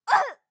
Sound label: town human road hit; Blind Drive